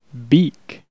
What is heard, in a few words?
beak; Know Your Birds of Prey - Vultures to Falcons